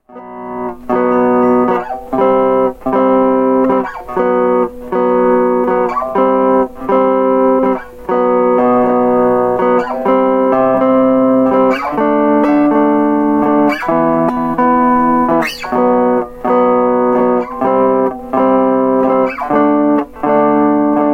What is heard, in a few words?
Power chord